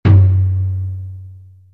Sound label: taiko